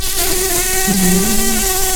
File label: Lapidarius queens, bumblebees